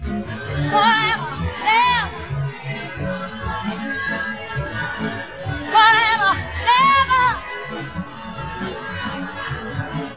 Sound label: lead voice; sound source separation